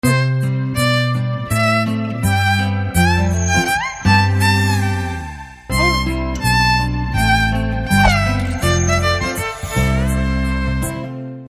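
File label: Teaser background music Telugu Ringtone; F2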